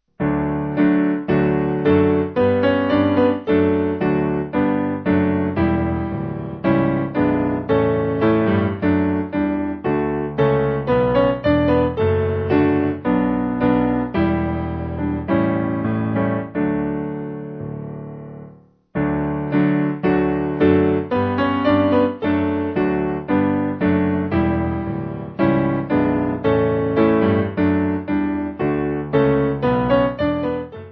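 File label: Piano, Music Backing Files for Churches; Praise Him Praise Him; Bonner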